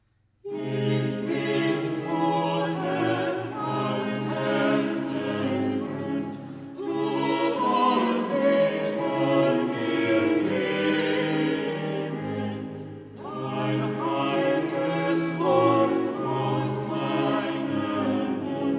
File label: Chorale; Gleichwie der Regen und Schnee vom Himmel fallt BWV 18; Ich bitt o Herr aus Herzens; Bach